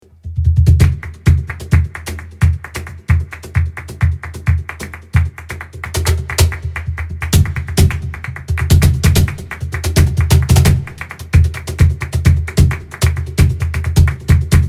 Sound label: cajon intro